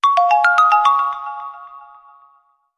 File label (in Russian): signal na sms samsung galaxy s4